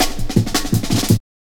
Pride Cut, Drum Loops, 165.5, Jungle; Zero-G Jungle Warfare 1, 2 & 3

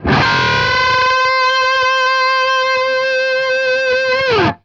DOUBLE C 4, guitar; East West; Steve Stevens Guitar Samples Collection; DOUBLES

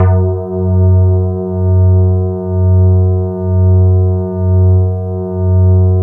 E-HARP, DX HARP; Wizoo - Powered DX

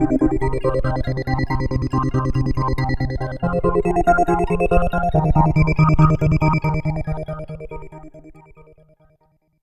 Pulsing; Dr. Fink's Funk Factory

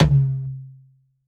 MEDIUM TOM, ELECTRO KIT

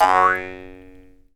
JEWS HARP, Earth Tones; Jew's Harp & Spoons

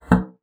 BASSOON; Xsample VOL-6

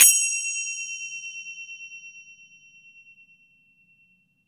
FINGER CYM

